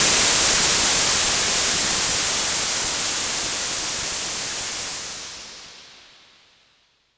Super Bass Station Raw, White Noise Raw; noise hiss crackle

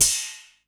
SPLASH, Anime Sound FX; COMIC

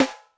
JAZZ SNARE; Greytsounds VOL-1

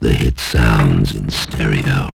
hit stereo; AMG - Now CD-ROM (Roland); NOW! Dialog; NOW! Talk 1

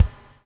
BUBL; Spectrasonics - Backbeat

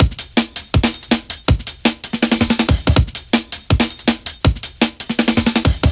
Loops, breaks; M8

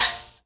MOUTH BOW, Heart of Africa

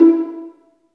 String Vlns Pizz

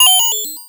MOOG HAT; AMG - Vince Clarke Lucky Bastard

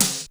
PIPE SD, TECHNO; 90 sSampleCDs; Crypton - DrumBase5000

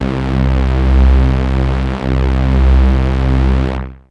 303-Style single hits

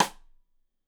Side Stick Stereo; Deeply Sampled Drums

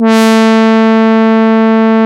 LEAD, Jupiter Brass; E-MU Producer Series Vol. 2 – More Studio Essentials

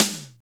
SNR, Tekno Industrial; East West

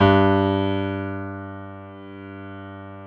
PIA PRO, Roland; Sound & Vision; Gigapack I CD 2; PIA GRAND 1; PIA Grand 3

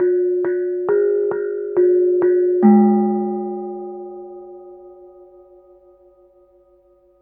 KENONG; Spectrasonics; Heart of Asia; KENONG PH

